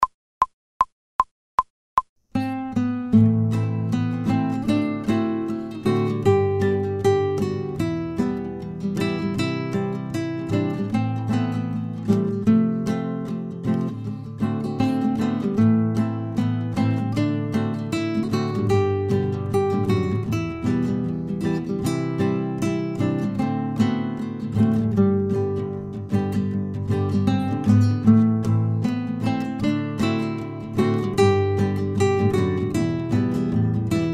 fast, guitar, without metronome

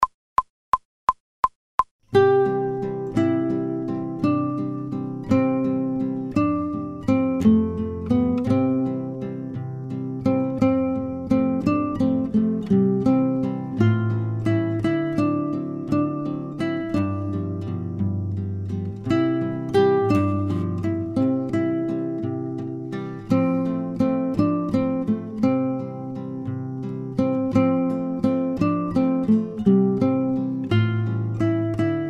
fast without metronome, guitar

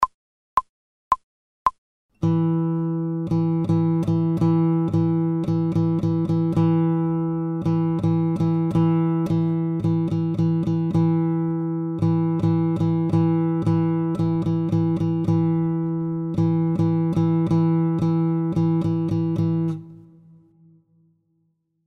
fast without metronome, guitar

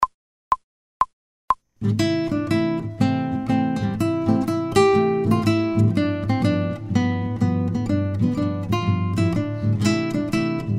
fast without metronome, guitar

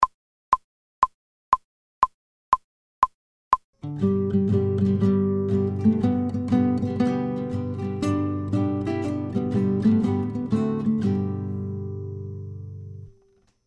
fast, guitar, without metronome

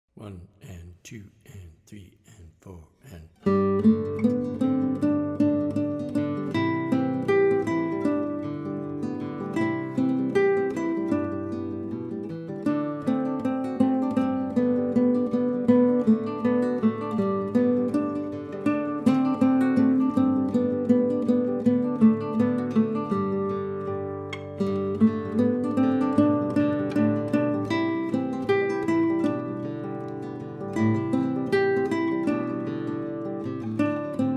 in G, guitar; Song of the Wind

